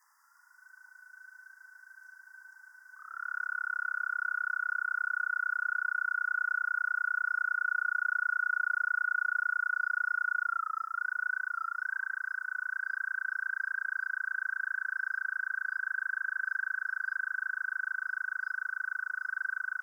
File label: American Toad